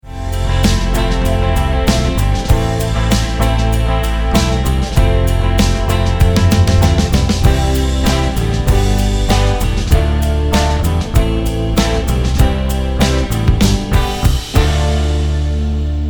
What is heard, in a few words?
no Vocals